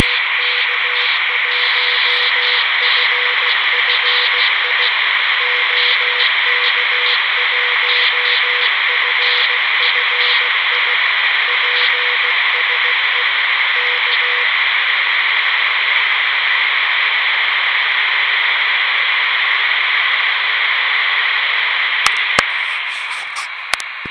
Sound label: cw, Quansheng, CW Test